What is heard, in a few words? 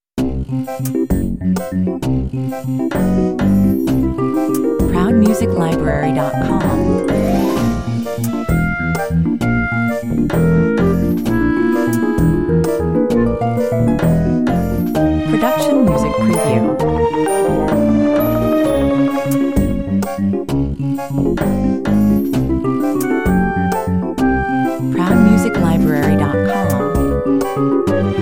Silly Children tune for cartoons, animation or children show, happy and fun, 130 bpm